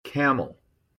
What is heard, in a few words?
camel